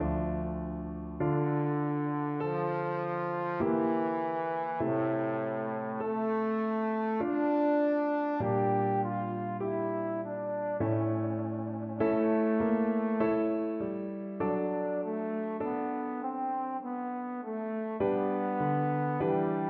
sonata, trombone

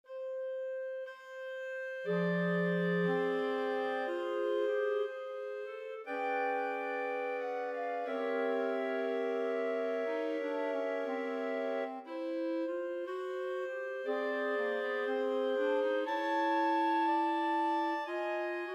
happy jazzy birthday, clarinet choir

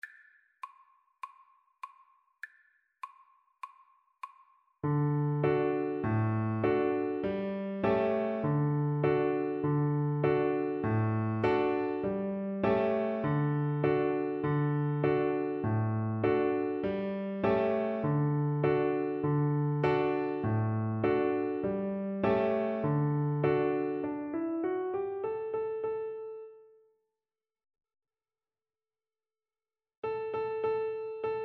Cello

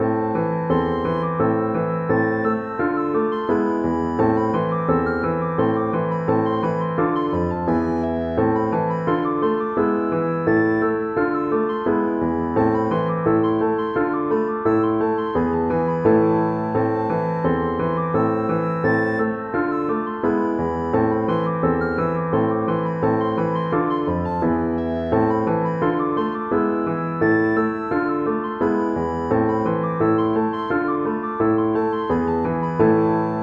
count leon recorder

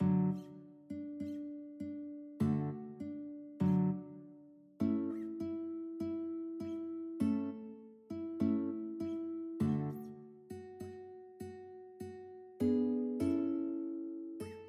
guitar duet

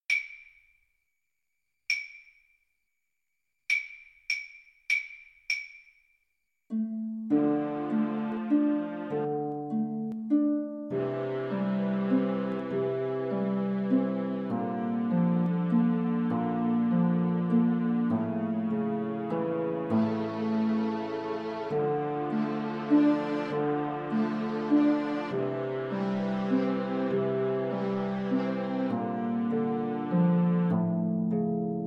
greensleeves kar, Flute

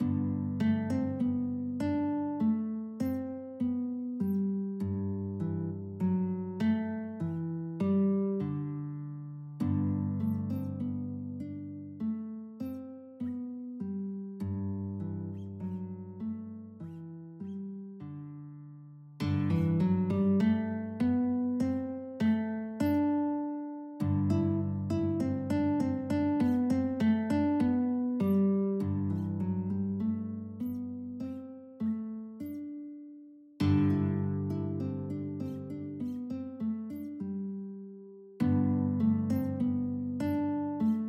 le coup, guitar

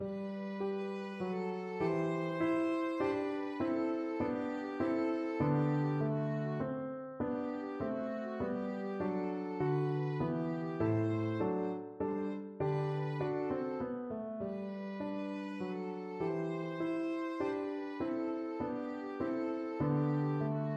minuet violin; arne

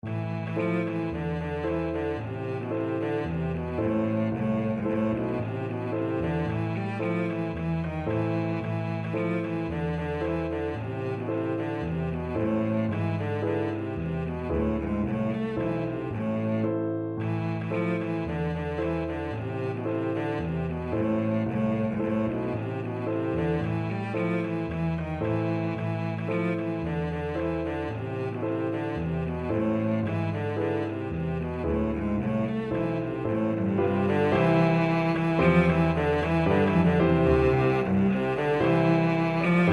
cello

